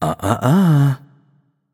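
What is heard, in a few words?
nonverbal disagree; voicepack; smite; merlin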